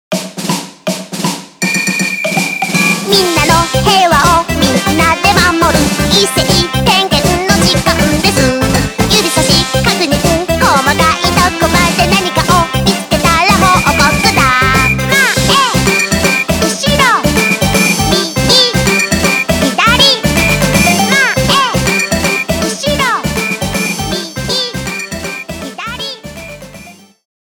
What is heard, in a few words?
jingle; nekomirin; comiket